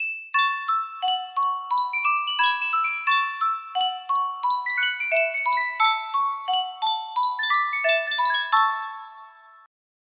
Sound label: sankyo miniature music box